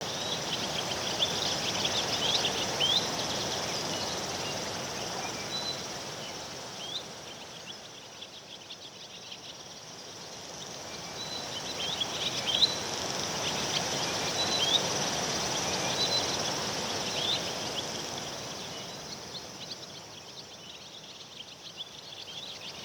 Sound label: Wetland Forest Day Amb Loop; Midrath Island; Ambience